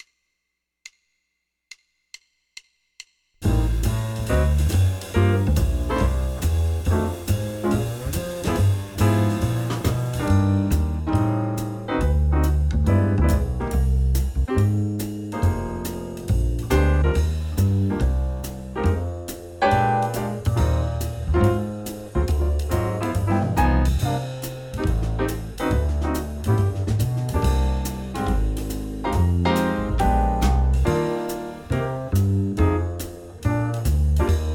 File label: Cm, 140